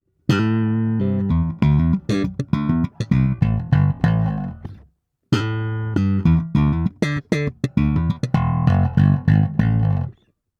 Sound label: parallel SLAP